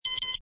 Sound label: beep beep